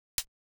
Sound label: Hat Closed Dirty; hydrogen